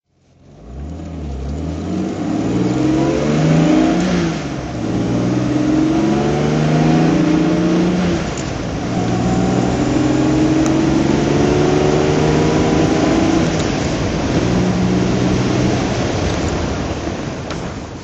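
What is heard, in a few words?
jaguar e type 42 ots drive, Engine sound driving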